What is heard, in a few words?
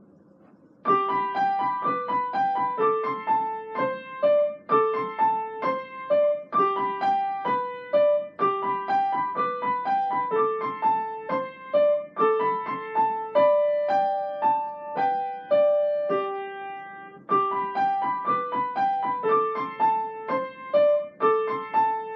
Music Box Rock